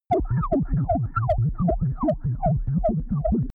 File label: crazy science lab